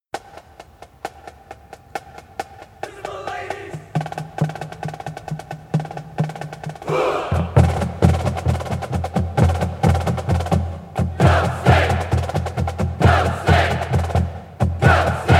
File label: Fanfare, percussion